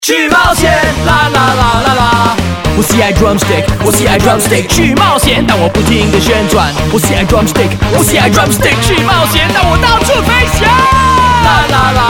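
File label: MANDARIN Jingle MONO; Nestle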